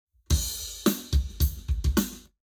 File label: Lab Wet Drums 90deg; eBreve